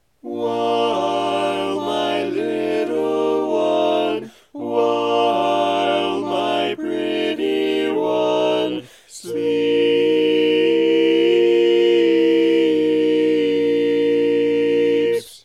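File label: baritone, barbershop tags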